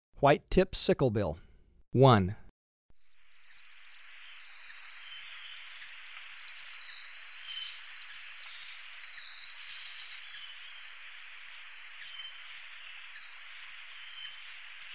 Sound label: White-tipped Sicklebill; S. America